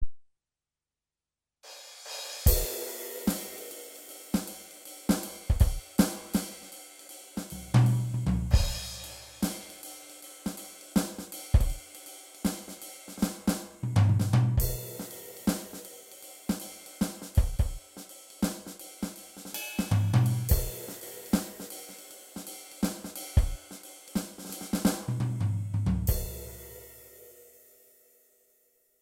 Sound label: easy low; Roland TD-8 or TD-6/6V; Elite